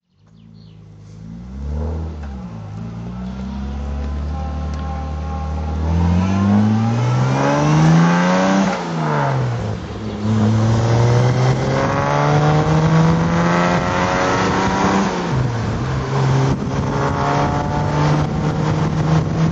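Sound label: jaguar38 special drive, Engine sound driving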